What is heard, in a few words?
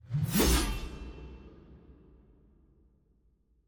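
guillotine whoosh; ambience room torture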